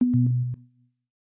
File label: loss